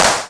Hand Clap Sample A Key, Royality free clap sound clip tuned to the A note. Loudest frequency: 3334Hz; single hits one shots